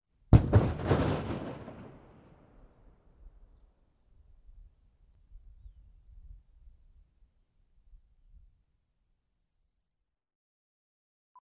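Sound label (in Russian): из окна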